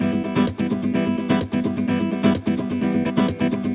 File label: Nylon Guitar E min, 128BPM; Vengeance Samples; VEH3 Nylon Guitar Kit 1